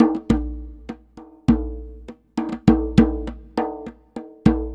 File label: Java Ket Jak, Ethnic Percussion; Sonic Foundry (Sony Creative Software); Ambient Atmospheres & Rhythms